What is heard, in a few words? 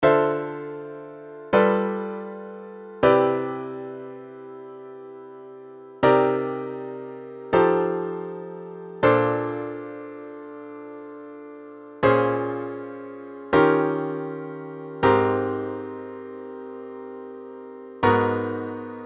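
exercise whole tone; jazz chord progressions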